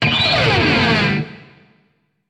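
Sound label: guitar scratch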